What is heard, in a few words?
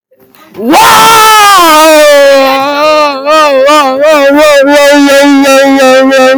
very loud haa